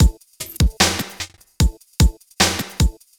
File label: Beat, Futurist Drum & Bass; Drums & Percussion